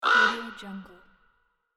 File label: crow